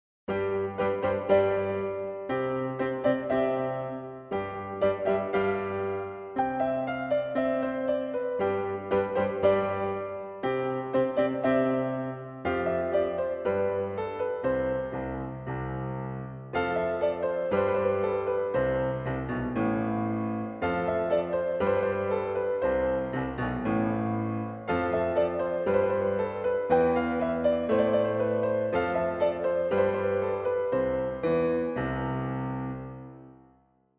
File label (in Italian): minuetto